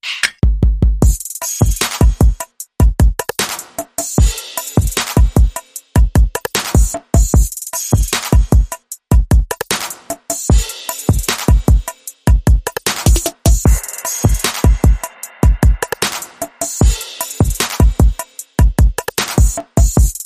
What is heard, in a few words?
Smooth Beat